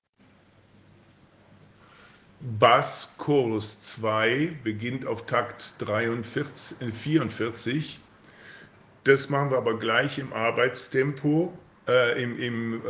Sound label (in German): Bass originaltempo